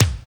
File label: ramrod kick; NATUR. K1